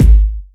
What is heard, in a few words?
verby kickdrum sound g sharp key